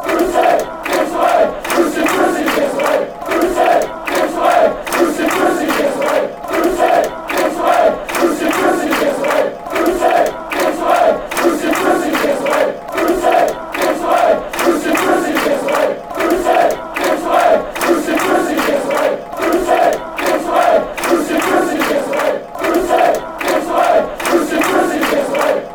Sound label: give us a wave, fanchants; brucey